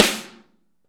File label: SN, Real Mega Drums